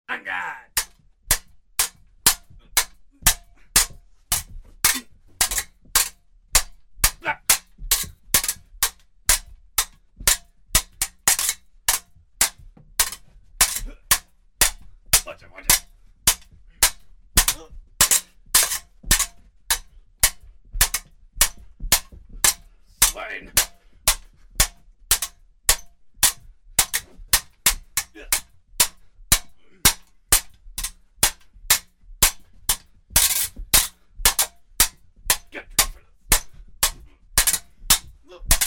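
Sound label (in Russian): сражение двоих в конце вскрик и звук падения тела; Звук мечей; Звуки сражений на мечах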